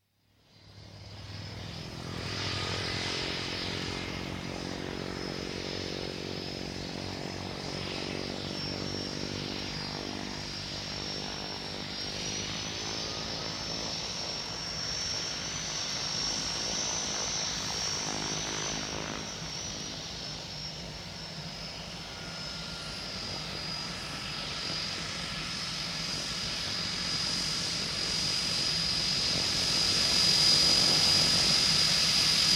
Embraer Bandeirante, exterior, approach runway, take-off (Small airliner, 2 turboprop engines); Airport Sound Effects; BBC